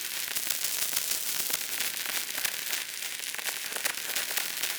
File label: Cracklature, sparse soundscape